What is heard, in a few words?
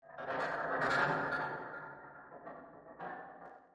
alien noise; no longer alone sfx